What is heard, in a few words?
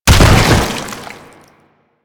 Bodyfall Default; Warframe; Proto Infested